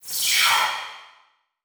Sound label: Transition Metallic Blast; Production Element; Title Transition; AdobeCC